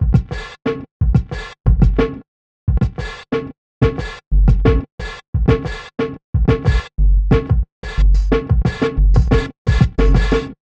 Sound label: sleeper 090bpm, Downbeat Dance Grooves; Zero-G